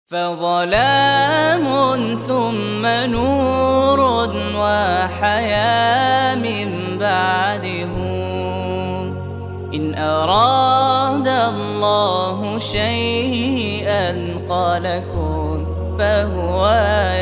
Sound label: Vocals Only